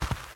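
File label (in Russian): короткие частые неприятные звуки помех в телевизоре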